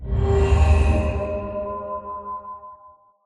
power, beacon; Minecraft; block

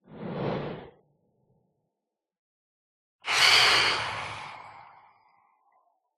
swoop, phantom; mob; Minecraft

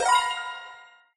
gold point; Minecraft

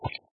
retrieve, bobber; Minecraft

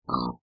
pig; mob; Minecraft

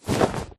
swag, llama; Minecraft

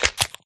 egg crack, turtle; mob; Minecraft

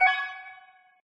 point; Minecraft

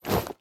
equip chain, armor; Minecraft; neoLegacy

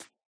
hop, rabbit; Minecraft

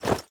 equip gold, armor; Minecraft

